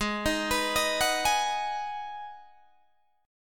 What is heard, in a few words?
G sharp Minor 7th G sharp, Guitar Open D